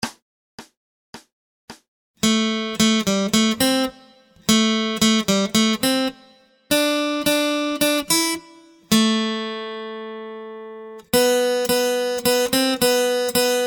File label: Acoustic Accompaniment, acoustic guitar